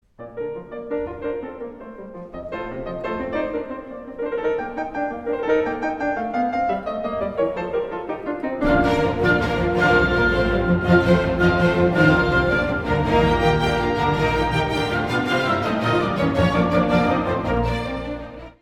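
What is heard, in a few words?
Piano Concerto; Beethoven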